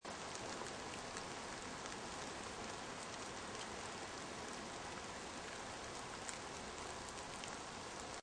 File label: Light Rain Loop, Light rain, seamlessly looping sound; Weather